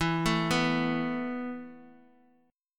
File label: E Suspended 4th E, down, 4G,5C,5E,5A